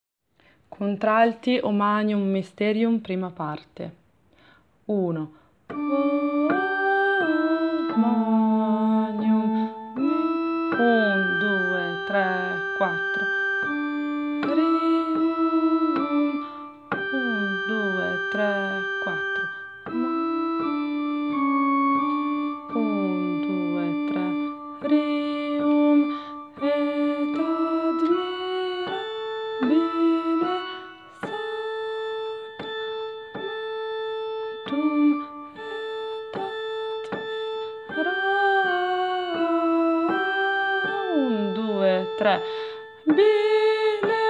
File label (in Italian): O Magnum Mysterium contralti